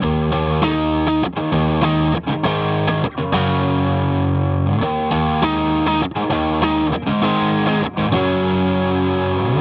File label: 100 D Elec Gtr